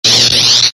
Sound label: saber block